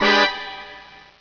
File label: brass; le diamant